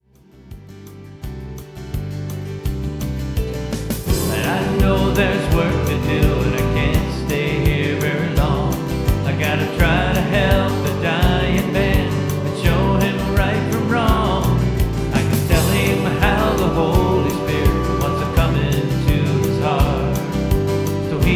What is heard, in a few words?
w lead n harmonies